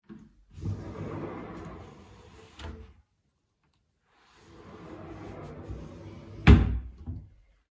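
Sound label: sliding door in the bedroom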